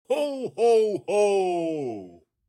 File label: SANTA LAUGHING